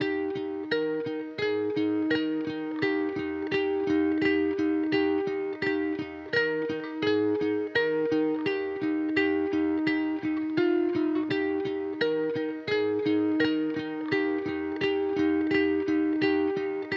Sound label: 85 BPM C Min Hi Guitar; Loop Stems; Cymatics; Full Moon